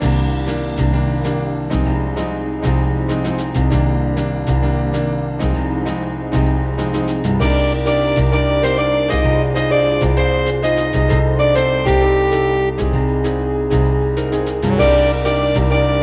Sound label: BGM Minigame Card; Kenka Banchou 6 Soul Blood; 3DS